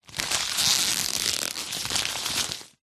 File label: foley paper wrapping handling squeeze